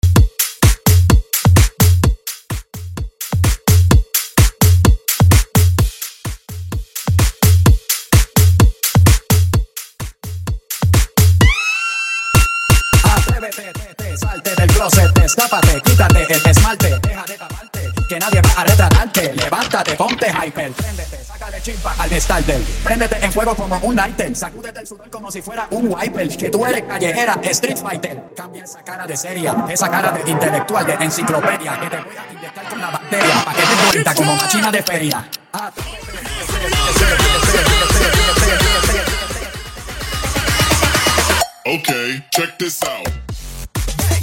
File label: tech bootleg 128bpm